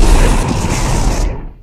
roaster; raven

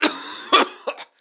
cough; worm, bf2142

